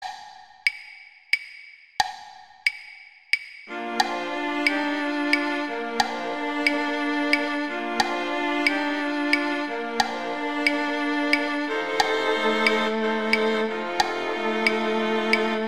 Cello; Gruber; Silent Night

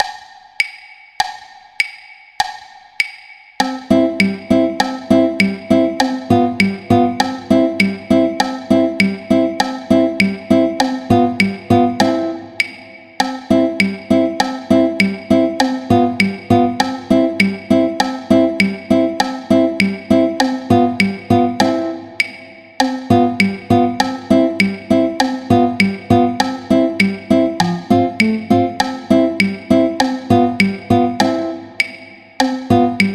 Andantino Violin; Paganini; Sonatas Op1 Vl Gu

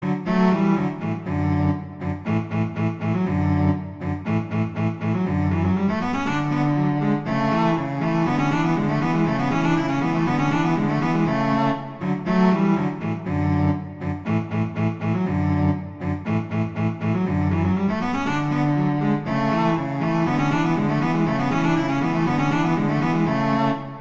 Duet; Mozart; Duets

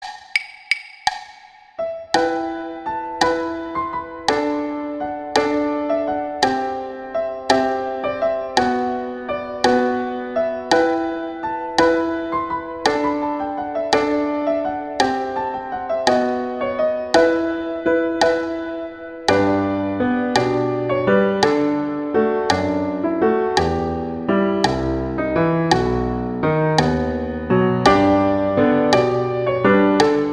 What Child Is This Greensleeves; Christmas

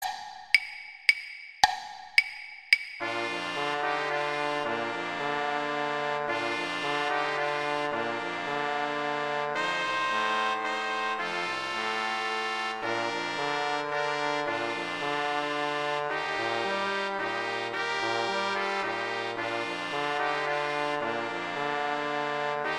Tuba, Brass; Gruber; Silent Night

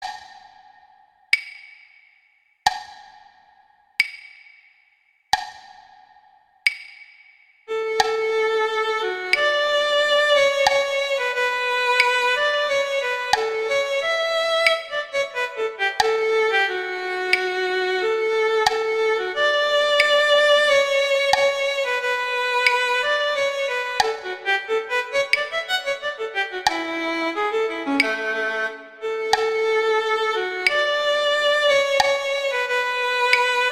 Andante Guitar; Paganini; Sonatas Op1 Vl Gu; Sonata No 3

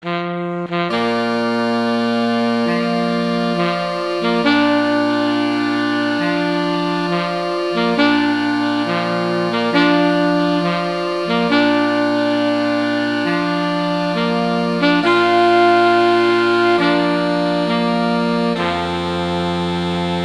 Taps, sax quartet; Patriotic

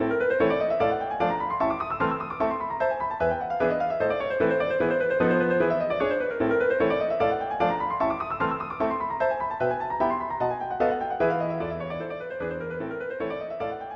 Etude, Allegro; Chopin; Etudes

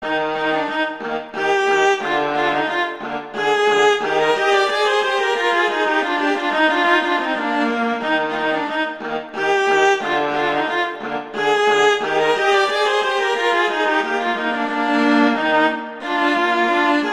Duet; Mozart; Duets; Vla

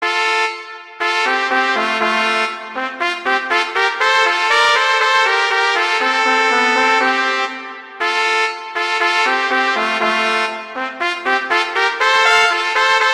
Duet; Mozart; Duets

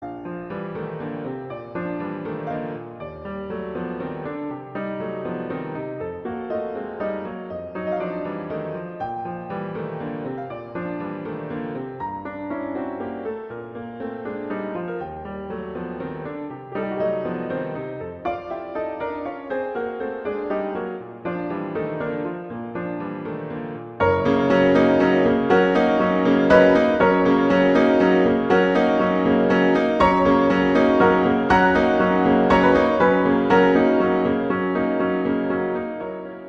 B flat major, Cantabile; Chopin; Preludes

